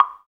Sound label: PRC BLOK, AFRICAN PERC; Roland LA